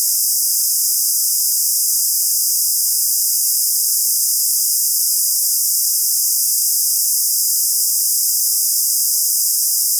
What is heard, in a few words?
noise samp